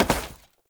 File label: Step Default; Warframe; Oraxia; Foley